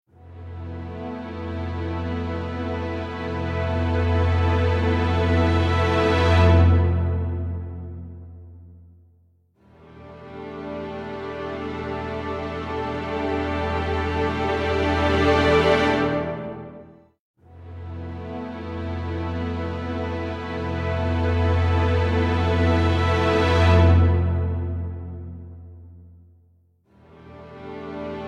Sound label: mecha battle boss; kikai battle boss 2 dirac sea lower mastering